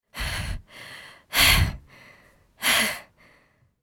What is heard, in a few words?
Female 20 years old breathing heavily and exhausted